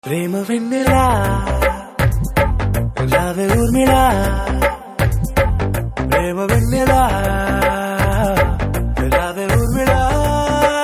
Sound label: Telugu Ringtone